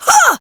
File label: Punch, Barks; Kuva Lich; Persona B; Ability